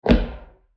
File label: footfall boot slam; soundscrate